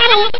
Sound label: alien; arcade smash hits